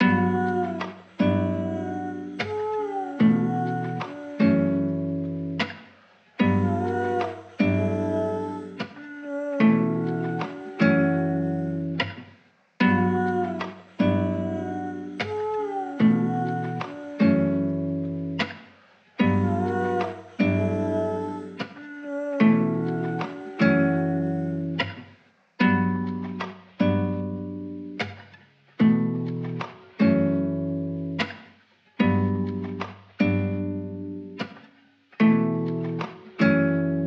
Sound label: 75 BPM B Min, Guitar Loops; Stefanie; Cymatics; Orchid Sample Pack